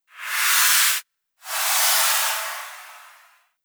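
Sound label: Ventilation, alarms medical; J Edworthy 2019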